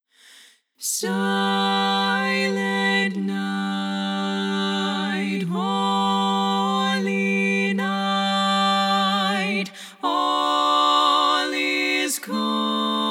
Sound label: Silent Night SSAA - LEAD Sing Along